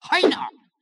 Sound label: slash; Mystical Ninja Starring Goemon; ebusimaru